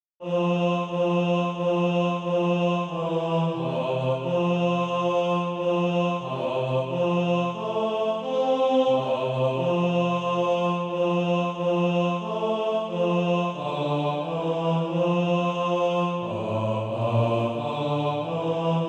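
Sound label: bass, Practise Music; SATB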